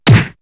In the Face, perc fx; X-Static Goldmine

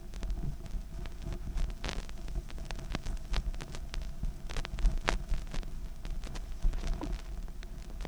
Vinyl, noise; layering

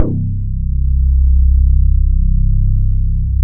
MKS BASS; AKAI S6000 CD-ROM - Ultimate

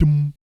BS DMM, Vocal Planet Jazz & FX; ILIO; BASS DUMMS

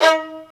STR, Roland String Master Series, Vls Marc

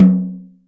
Lite Hi, Acoustic Tom; Yamaha DTXpress

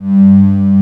VO G1, ANA VOX, SYNTHESIZER; AKAI S6000 CD-ROM - Volume 1

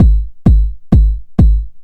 kick pipe; Zero-G Deep House; 130bpm

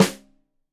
Rim Shot Stereo, drums

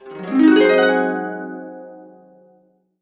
harp, -R; Complete Harps; USB Soundscan vol.62; AKAI

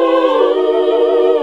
SING, CLASSIC VOX; Best Service; Hallelujah

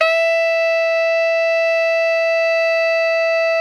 TENOR FF E 5, Saxophones; Greytsounds; Philip Wolfe David Crozier's Saxophones

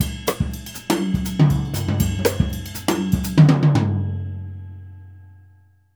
Jazzistic; Univers Sons

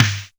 snare exciter

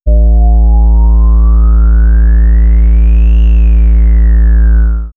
Swirls Ambient Drone, pads; X-Static Goldmine

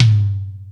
Rock N Roll, Drum Machines

